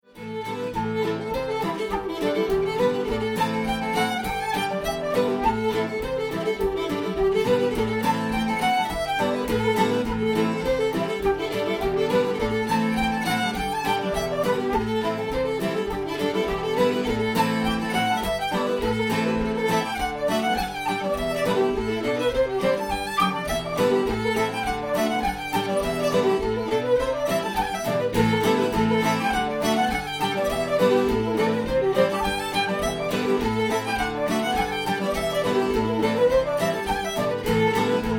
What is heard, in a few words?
Distant Bells